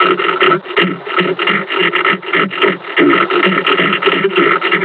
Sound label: breathing, combine charger; Half Life Alyx